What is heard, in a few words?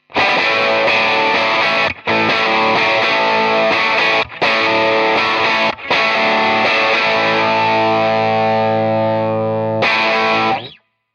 Tele straight Rock